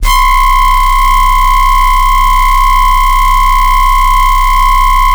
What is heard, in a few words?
Squeezer, Noise Osc; Cymatics; Shapeshift